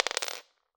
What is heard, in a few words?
Drop On Floor, Medium Wine Glass